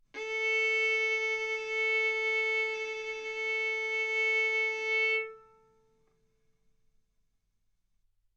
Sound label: Violin arco mf sul A A4 B4 stereo